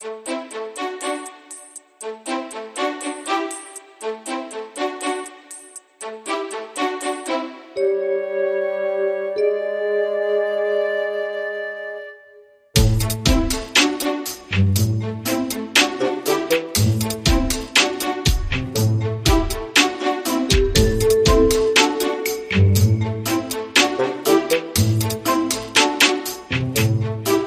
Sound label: Modern Percussion